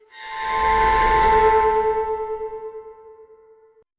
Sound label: bound magick bow charging, dark fantasy